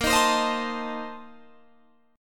A sharp Major 7th Suspended 2nd Suspended 4th, Guitar Open G